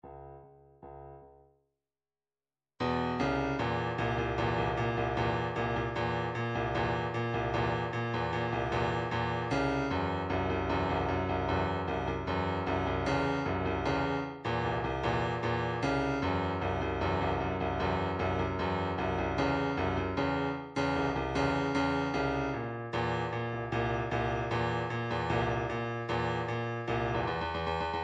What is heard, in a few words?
drum trax hard rock 152